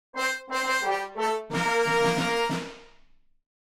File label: Mobile game epic level up